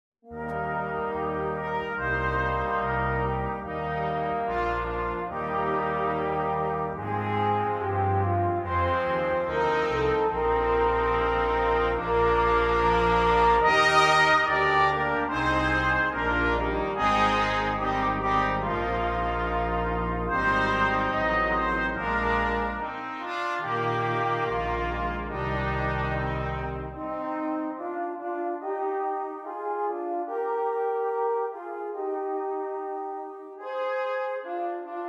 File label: Aland Islands national anthem